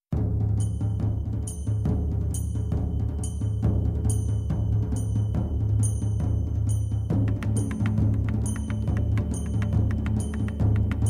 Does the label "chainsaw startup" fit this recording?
No